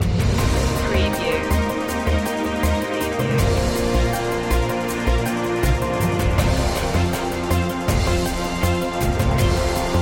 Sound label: informative news stinger